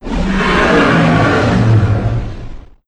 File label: trex, sf effects; sinfar haks